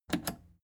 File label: plastic button scratch smooth click disable fast